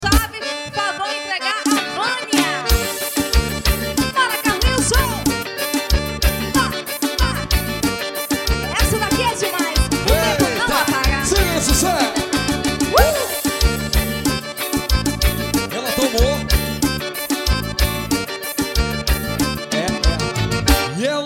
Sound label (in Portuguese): forro ao vivo; na quadra de rapaizinho